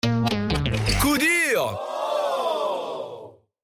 Sweep Coup dur

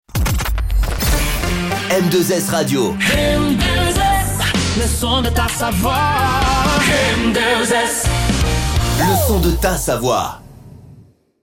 Jingle Chante; M2S

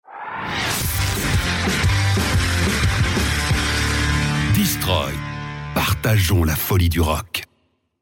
Sweeper Folie du Rock; Destroy

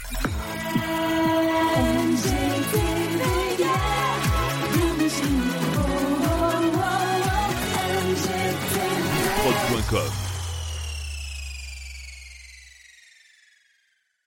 Jingle chante; NGTV

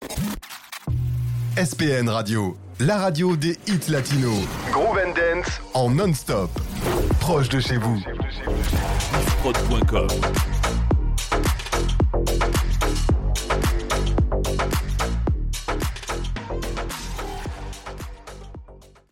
Radio Rampe Electro; SPN

